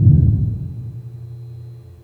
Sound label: low noisy thumper; Sonic Foundry (Sony Creative Software); Ugly Remnants VOL-2; Beatstuff